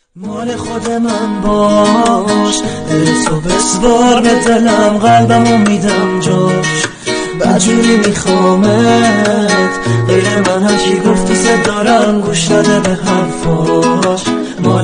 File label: Gitar